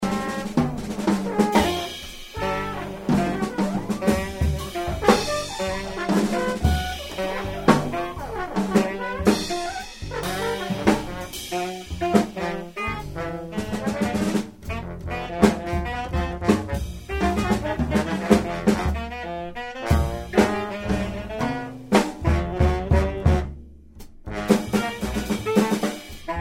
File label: drums